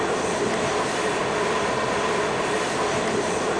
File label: run, Train; OpenBVE